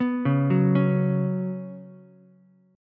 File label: F sharp Suspended 4th, Ukulele; arpeggiated; F#sus4